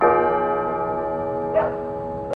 bell toll with bark

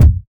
sharp kickdrum sample D# key; kick drum samples

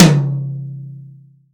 acoustic tom sample c sharp key

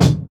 old school rich top end kickdrum c key

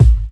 boomy kick a sharp key; Duration 0:01; Mono; kick drum samples

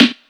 studio snare c key

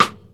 00s dope snare sample g sharp key

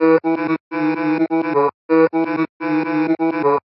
chopped vocals female E 159; sound effects

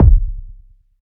boom steel kick drum sample c key

